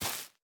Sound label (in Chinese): 孢子花踩踏声